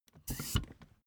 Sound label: office chair hydraulics down stepwise